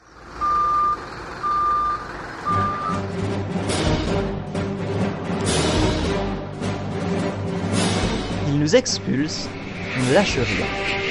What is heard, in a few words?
jingle; reoccup avec date